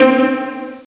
pizzicato C4; Star Trek JR Audio

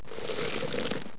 pour H2O; landslor

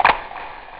snap; OctaMED SoundStudio V1

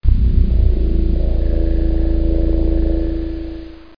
TUBE BUZZ

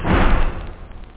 clap; da capo vol1; drums nature

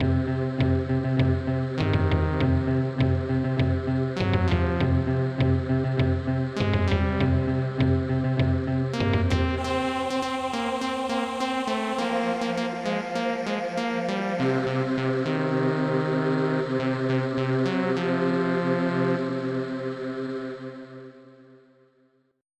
theme; crush; PC Gamer IT CD 21

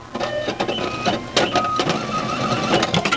servo

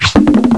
ball; Alien

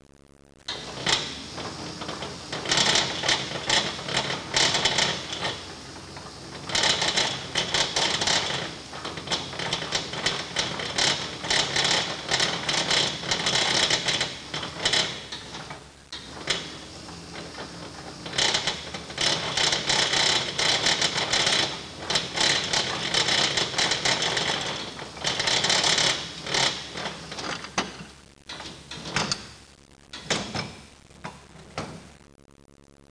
reel in, fire; disaster

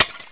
GLS PING

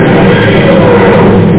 Teleport; wormnoiz

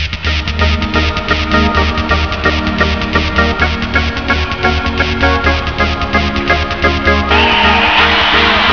TECHNO

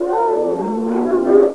moan

